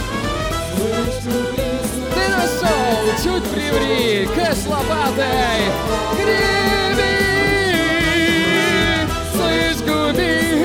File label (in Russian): vokal